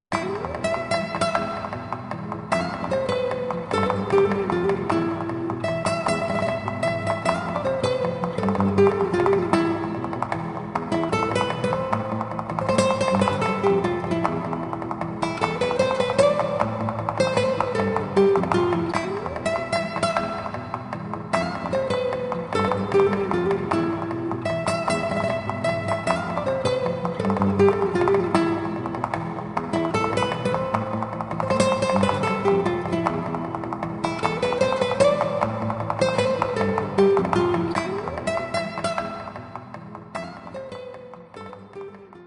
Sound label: Flamenco; Kid Pix 3 Deluxe; Windows